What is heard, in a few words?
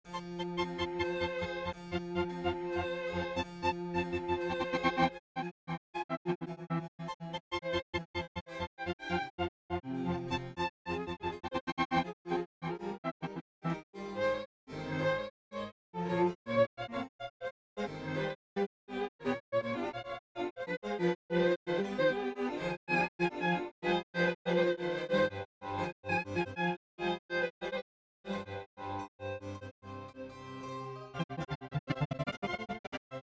classica